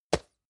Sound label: plastic can small lid close hard